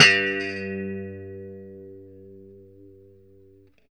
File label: POP G 2 G; Spectrasonics; Hans Zimmer Guitars VOL-1; JC-STRAT POP